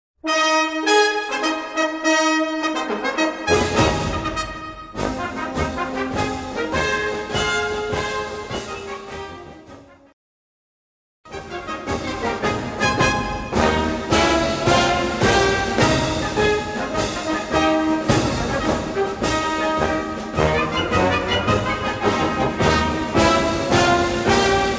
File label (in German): Marsch